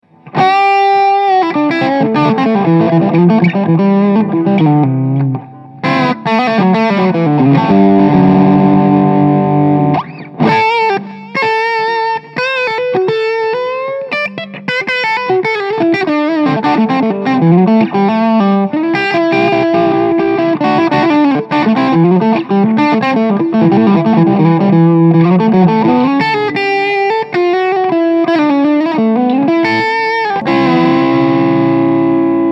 düsenberg Steg Lead Bluesy

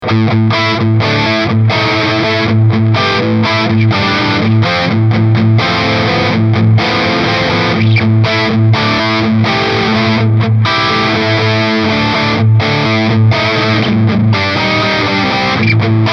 Diezel

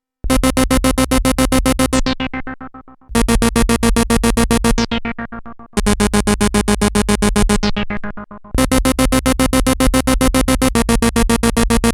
Roland SH-101

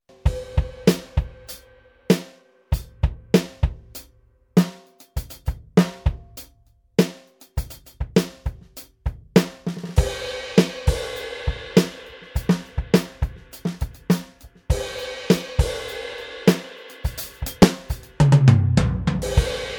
Kit Vintage; ATV a5D

